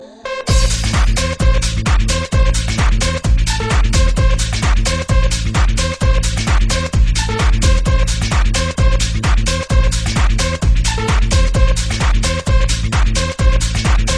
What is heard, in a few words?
Club Tone